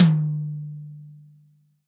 big tom sample d key, Royality free tom sound tuned to the D note. Loudest frequency: 1161Hz; tom drum